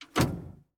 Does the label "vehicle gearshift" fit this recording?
Yes